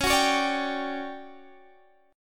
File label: D Major 11th Db, chord